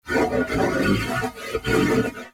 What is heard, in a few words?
Horse Trot Breath; Warframe; Duviri Horse; Vehicles